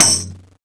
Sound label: TAMB; Houseworx; Drums; Perc